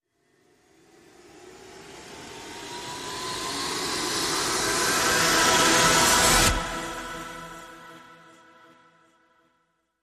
rise short; Propelling Charge

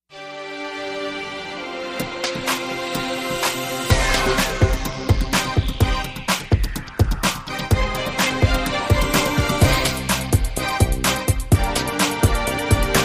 News Beat

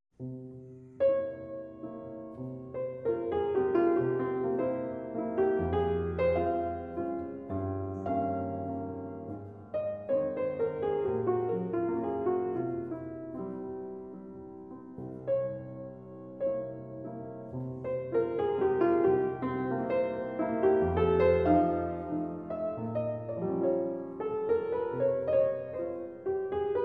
Mazurka in F minor; Op 63 No 2

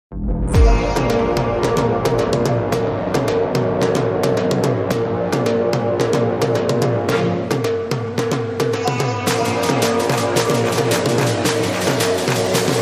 U Need A Cake, No Sax No Vocalchop

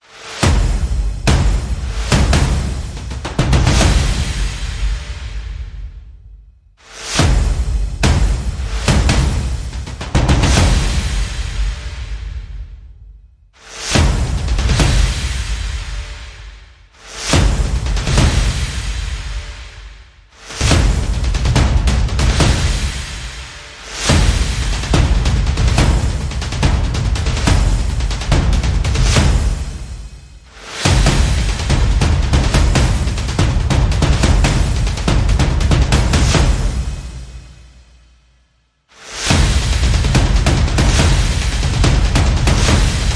Percussion Only

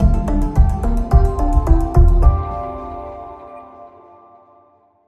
Bumper

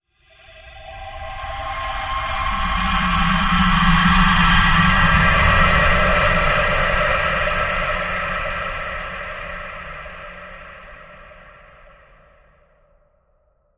Nymph Nature Transition

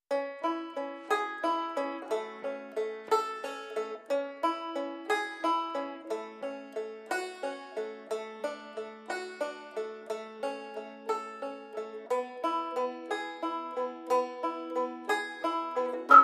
Whistle Banjo Vibraphone

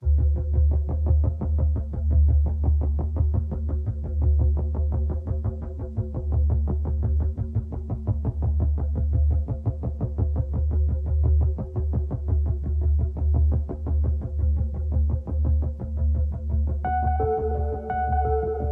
Underscore

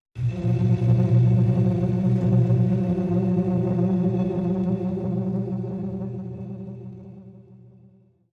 Sting

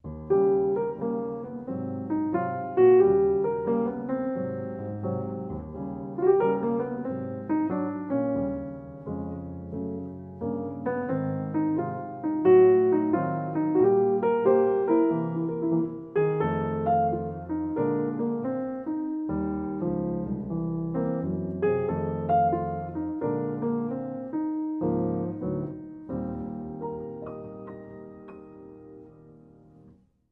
Solo Piano

